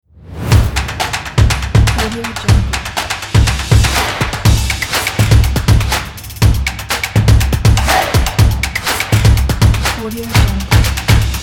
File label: drums logo pack